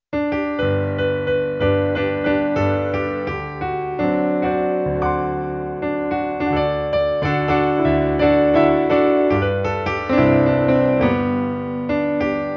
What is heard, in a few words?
Instrumental, pure piano; Just piano (no vocals)